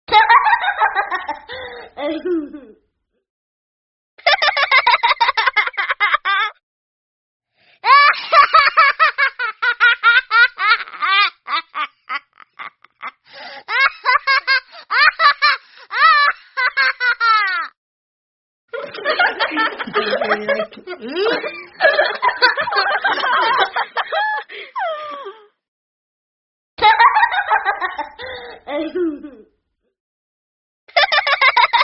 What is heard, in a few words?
kids laughing many laughs